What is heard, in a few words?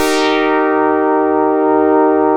BOLERO DR, Synth and Organ; InVision Interactive; Keith Emerson